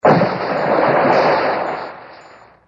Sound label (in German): Explosion; Muehlgraben